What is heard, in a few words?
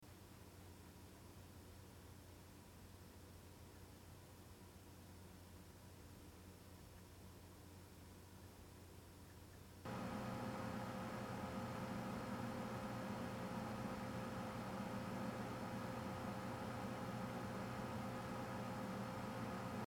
lianli pc101 1ft 26dba